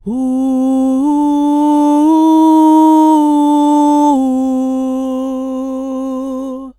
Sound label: Vocal Lines Cm 60Bpm; One-Shots; Ghosthack; SkyRealm